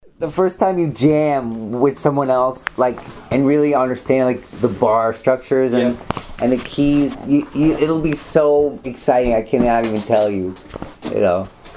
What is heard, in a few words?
blues